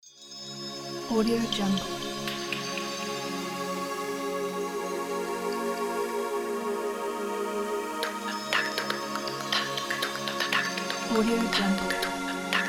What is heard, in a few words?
cinematic ethnic world pack